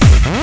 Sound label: Kick n Bass